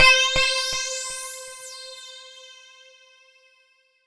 C5, SynthLine; 90 s Sample CDs; NiceBeats; SynthLine VOL-1

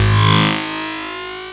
D+B

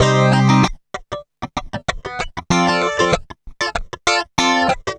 CLEAN, CLEAN GTR

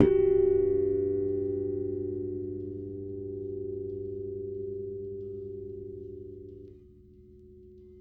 Harmonic GF#, Bass; Construction Kit; One Shots; Bass X

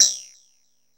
ANALOG BELL; Zero-G; Ambient 1

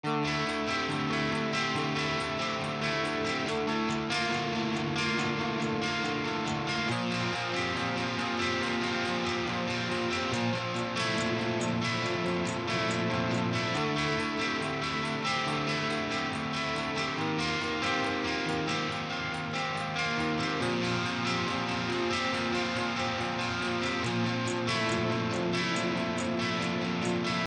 140 slice of life guitar

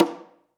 CONGA, ST CONGA; AKAI S-Series CD-ROM Sound Library VOL-5

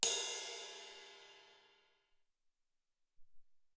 20 Ride

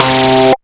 DIST SHORT; Big Beat Samples & More; AKAI; DISTOTHER KT

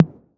808 SOFT; Wizoo - Platinum 24 Electronic

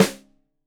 Rim Shot Stereo, drums; Deeply Sampled Drums

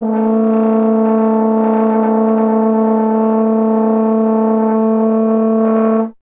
F. Horn; Miroslav Vitous; Solo Instruments VOL-2

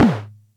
Music Percussion Computer Tom, Drum Machines

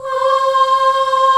MIXED, ORCHESTRA; Roland - LA Composer vol. 1

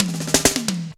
5 Point Roll, Drum Rolls; Zero-G Jungle Warfare 1, 2 & 3

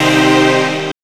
SYN, Jungle; E-Lab; XTC Files of Jungle

